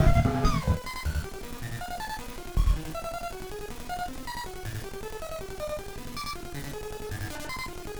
Battered Synth; malfunctioning synth samples

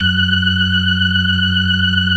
organ; Keith Emerson Signature Series

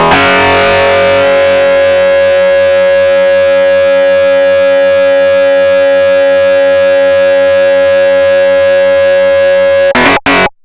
DIST STACK; Big Beat Samples & More; AKAI

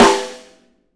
LUDWIG SNARE

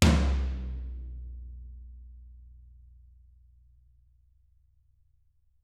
TT, Toms; Bob Clearmountain Drums I

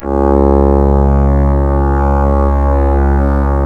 C#, strings; Big Fish Audio

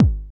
DR. LOOP, DRUMS; X-Static Goldmine 3

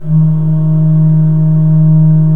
D2, Cathedral Organ; ILIO; Propeller Islands

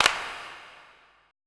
E CLAPS, ETH PERC

